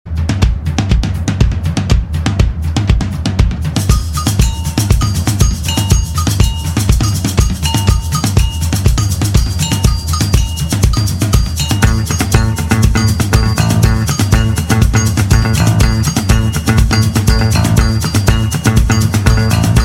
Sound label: salsa drums